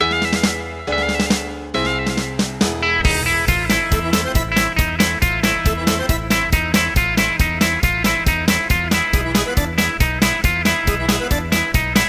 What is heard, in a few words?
Polka Appassionate; Disney Infinity